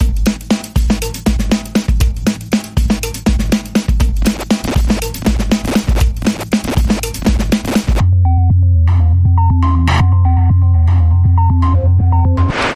Hip Hop Bumper Reversed

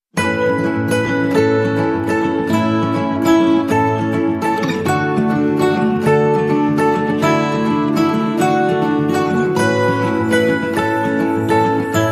Guitar Version

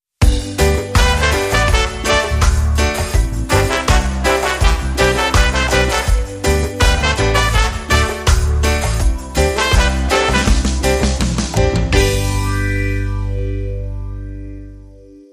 Siesta Vibe

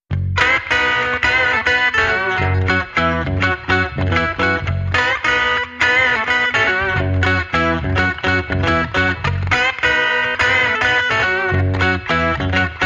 Electric Redneck, No Drums

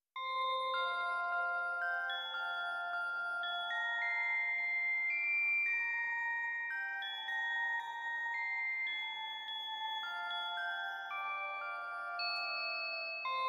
Twinkly Away In A Manger, Bells Mix

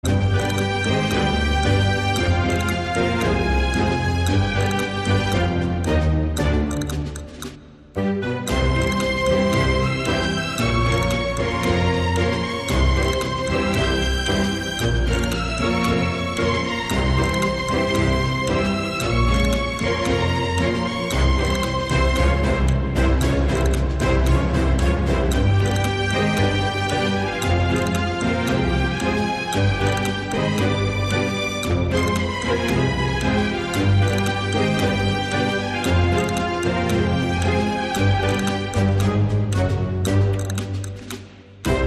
Pasodoble

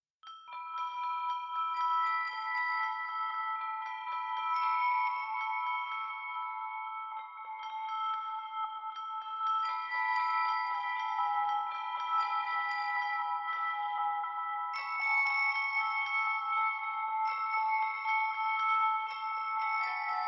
Underscore Bells

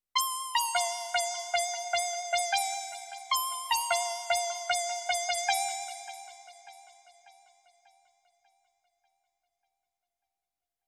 Synth Guitar

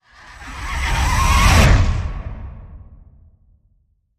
Gryphon Soaring Whoosh

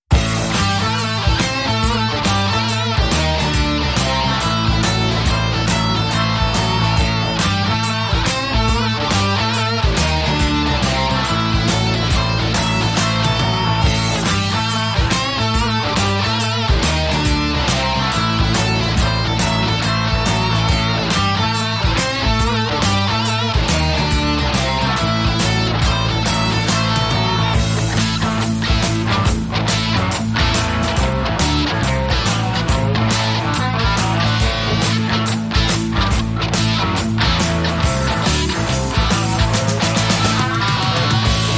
Boogie

